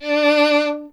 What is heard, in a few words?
STR VLN, Vln Detache; Miroslav Vitous